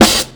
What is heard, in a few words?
Ball Snare Choke one-shot, Drum n Bass; KJ Sawka; Drum n Bass & Beyond; Drum Tool Box